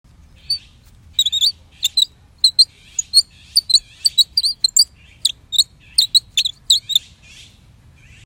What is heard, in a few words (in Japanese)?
バードコール